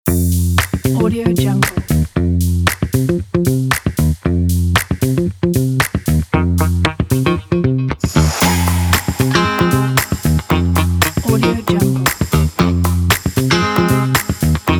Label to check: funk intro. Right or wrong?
Right